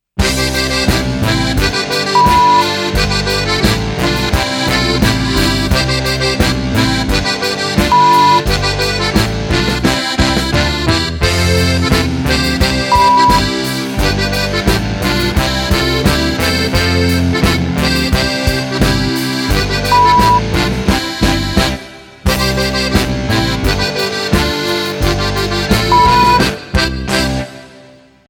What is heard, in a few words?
Boarischer C 87; Volksmusik, alpenländische, bayerische Musik, Zillertal-Sound; Zillertaler; Oberkrainer, Zither